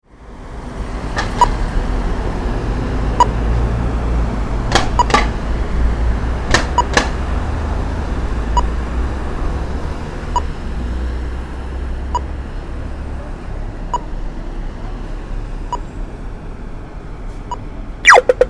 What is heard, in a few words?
singapore traffic